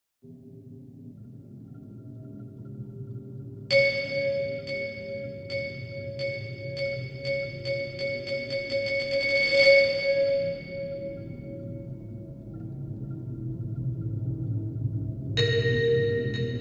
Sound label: Dropped Ball